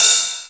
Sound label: Cymbals Ride; Vengeance Samples